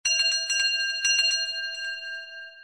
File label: Message tone; LG; UX300